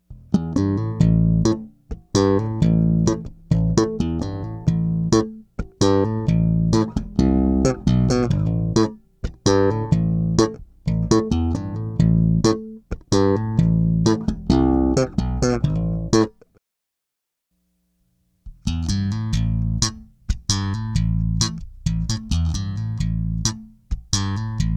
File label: Super Booster off then on, bass